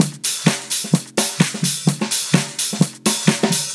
Housebeats, 128BPM; Vengeance Samples; VEH2 Loops

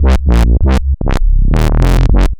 Roland A Line, Roland S100; musicradar-synth-samples

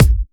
Soft Kicks; Vengeance Samples

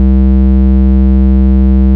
Ultimate Bass Root C; Vengeance Samples

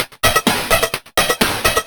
Electro Loop 128BPM; Vengeance Samples

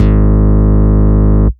Bass, Root G#; Vengeance Samples

